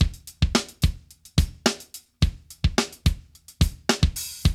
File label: BREAK